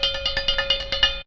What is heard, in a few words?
COWBELL; BEAT2000